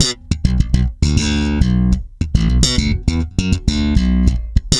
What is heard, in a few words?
B, hard funk, bass; Spectrasonics; Bass Legends